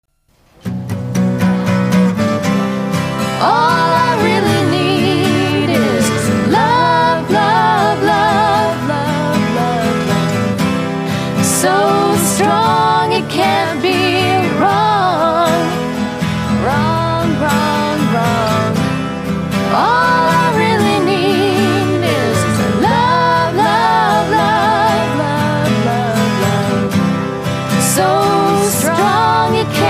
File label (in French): musique chanson